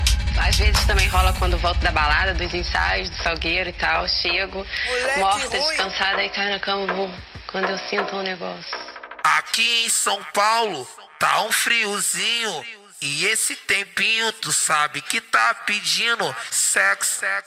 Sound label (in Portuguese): mega funk